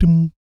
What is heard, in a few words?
BS DUM, Vocal Planet Jazz & FX; ILIO; BASS DUMMS